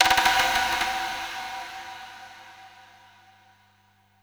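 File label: percussion, 140 BPM, drum & bass; Ueberschall; Drum & Bass Resonance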